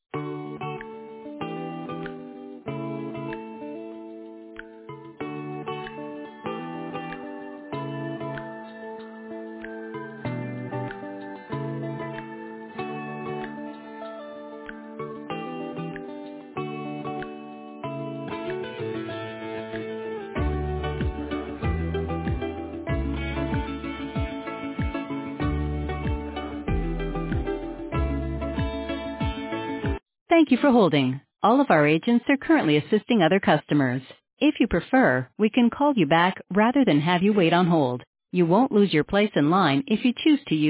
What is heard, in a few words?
on hold; cusinart canada